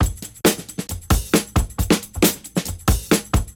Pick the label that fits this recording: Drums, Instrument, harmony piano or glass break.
Drums